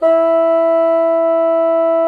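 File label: bassoon mezzo E3; Xsample VOL-6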